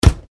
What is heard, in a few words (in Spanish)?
Nevera cerrada